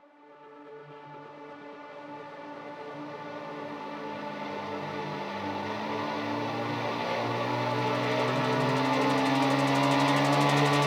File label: RISER, Cinematic Sound Effects; 99Sounds; Generdyn